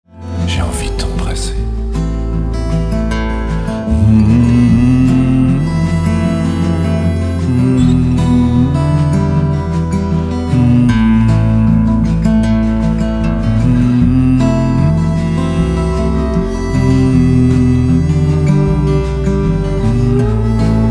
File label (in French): guitar voix